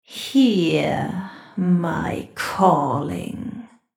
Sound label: female horror voice siren